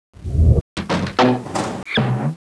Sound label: Laser Lab Sound